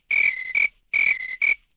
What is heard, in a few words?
crickets; Civ4 warlords